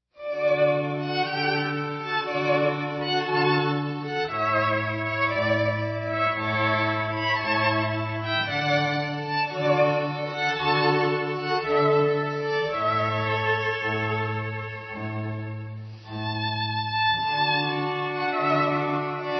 String Quartet Guitar Concerto in D; Vivaldi

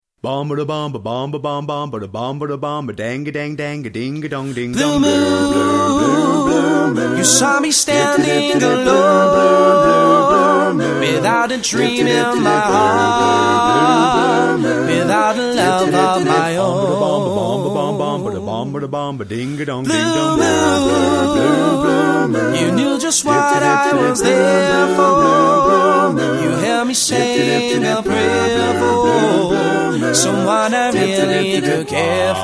Singing Waiters; Fantastico Singing Waiters

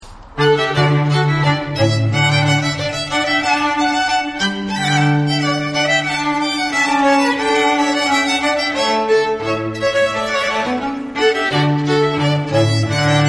Quartet, trio; The Leos Janacek Quartet

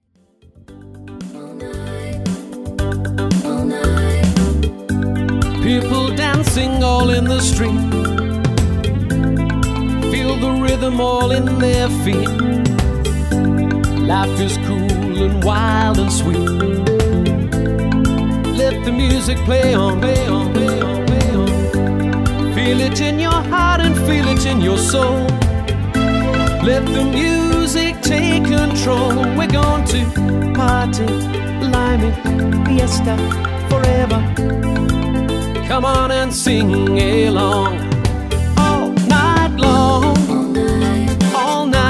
Wedding Singer, Superb Male Wedding Singer

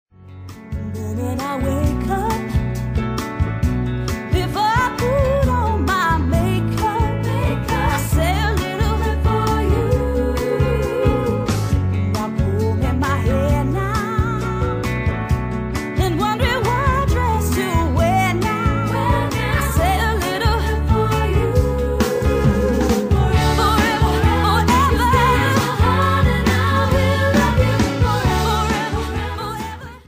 Motown

